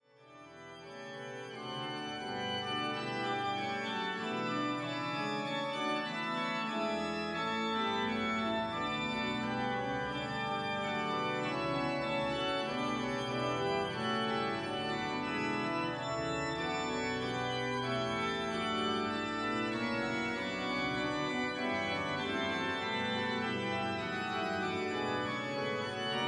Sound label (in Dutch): Hinsz-orgel klankvoorbeeld; Appingedam